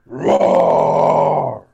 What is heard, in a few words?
rawr